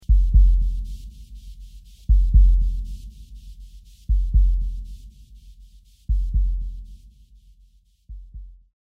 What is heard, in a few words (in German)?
angespannt music saving grandpa; Life is Strange 2; gamesoundopedia